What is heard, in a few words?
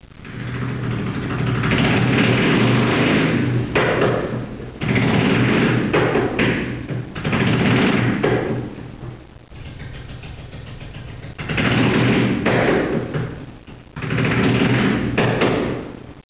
bmw r38